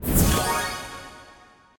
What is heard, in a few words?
wild Transform; netent